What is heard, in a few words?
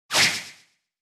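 attack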